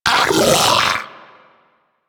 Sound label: Arachnoid Vocal Player Spotted; Warframe; Corpus; Arachnoid Hunger